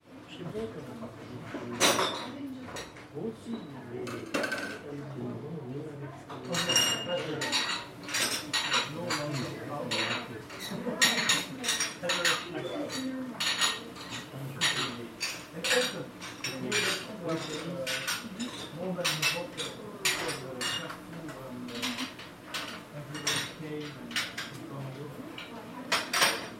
Small Bistro People Speaking French Plates Glassware; Julien Matthey; Ambiences Vol 1